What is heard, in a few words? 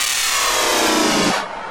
Fill 140 BPM